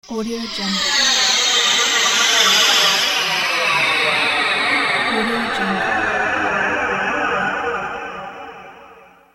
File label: ghost reappear whoosh